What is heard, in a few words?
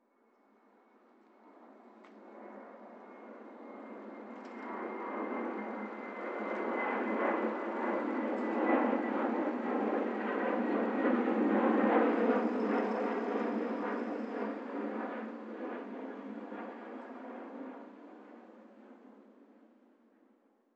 airplane, ambient; dust2